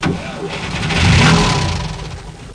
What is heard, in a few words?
car start